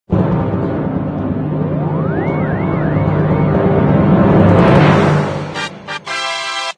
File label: fanfare; katamari; Source engine